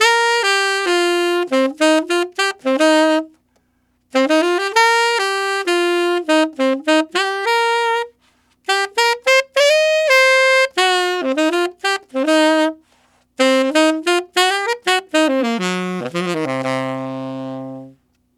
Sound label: Tenor RnB in C min, Tenor Sax Loops, Jazz; Sonic Foundry (Sony Creative Software); Jazz Solos and Sections